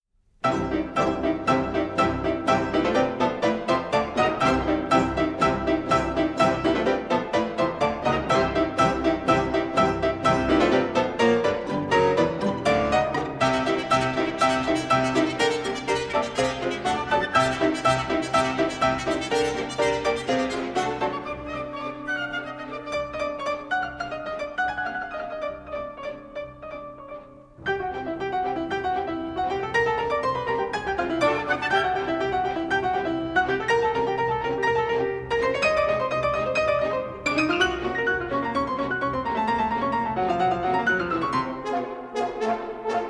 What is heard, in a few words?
Piano Concerto in D-Flat Major, Allegro Brilliante; Op. 38